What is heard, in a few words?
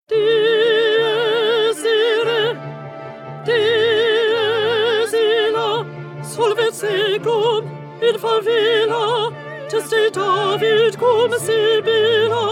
Alto Dies Irae; 2020 Mozart Requiem